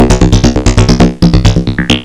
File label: BOINK